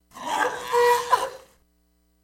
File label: Camel Mating Call; camels